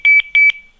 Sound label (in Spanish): Nextel Sonido